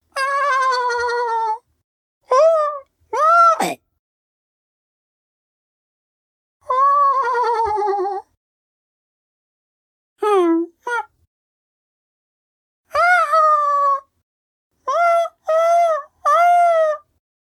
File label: Ambient Loop Grubs; Collector; Hollow Knight